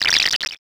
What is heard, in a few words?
text anim